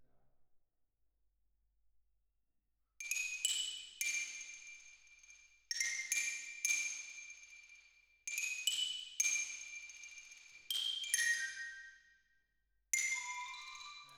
Twin R Xylo

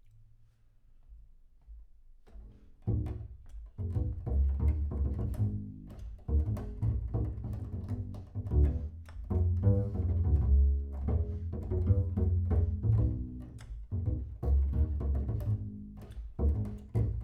Bass Room

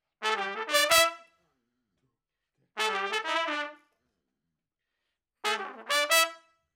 Trumpet Room

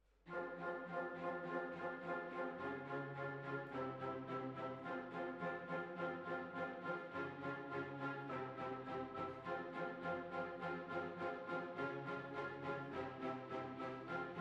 Hall

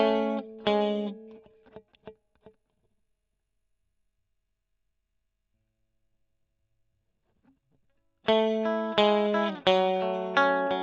E. GTR Gefell

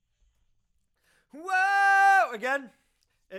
LV Choru

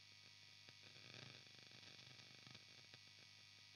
E.GTR AMP SOLO

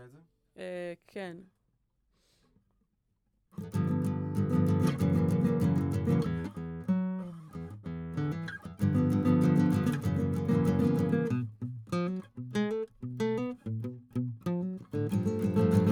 GTR

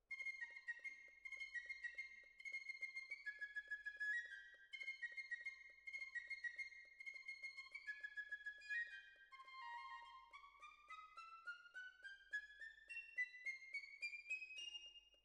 Violins, jazz for piano

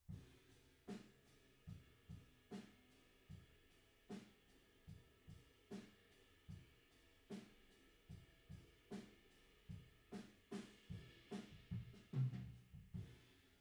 Piano B

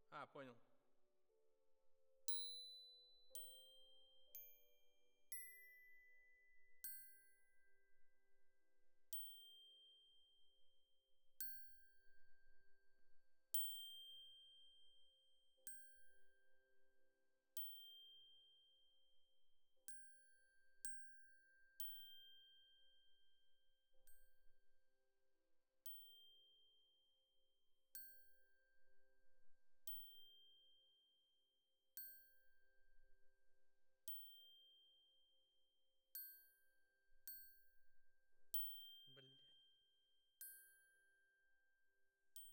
Glock Close

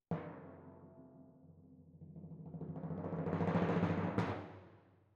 Timp Close

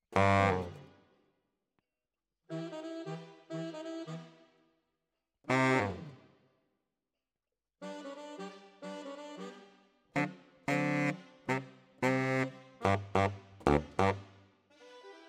Bari, RNR for piano

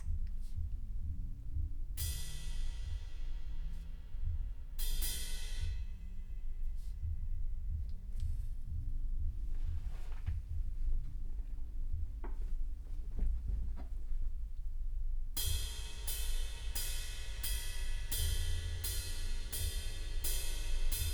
Room A

